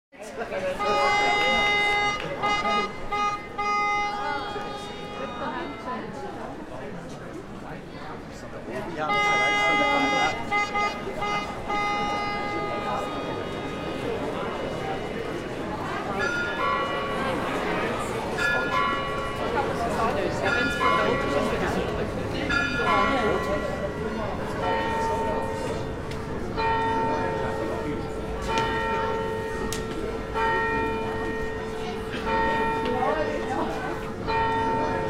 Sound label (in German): Basler Messglöckli